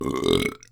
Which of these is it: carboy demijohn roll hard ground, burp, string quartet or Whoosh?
burp